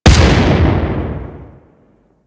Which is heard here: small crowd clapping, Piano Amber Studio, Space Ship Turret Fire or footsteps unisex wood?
Space Ship Turret Fire